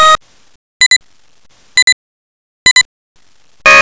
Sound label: timer; tfc; timerbag